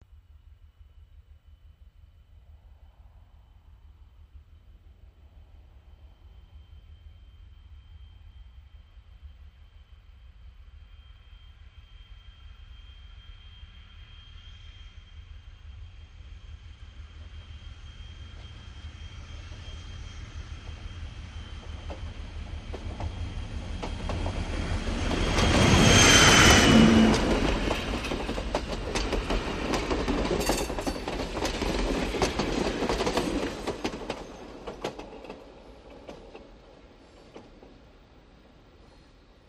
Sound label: Diesel Train 3 Cars By